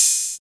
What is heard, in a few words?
Good Open High-Hat Sample G Key, Royality free open high-hat tuned to the G note.